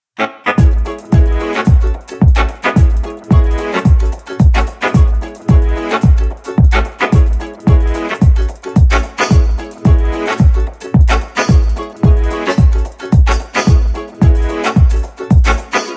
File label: dancing robots dancy electro swing music